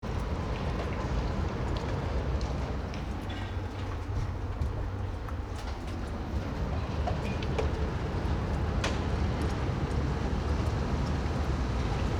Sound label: dripping rain shanghai suburb; Rainy day, Shanghai suburb (2)